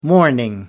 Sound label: mourning